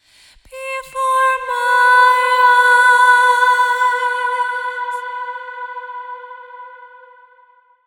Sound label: Short Harmony Vocal - Fm 60Bpm (WET); Construction Kits; VOCALS; Ghosthack; SkyRealm; Kit 04 - High Rock View